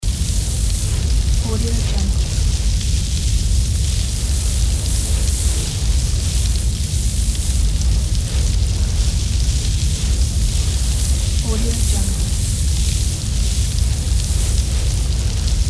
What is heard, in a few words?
building fire; آتشسوزی ساختمان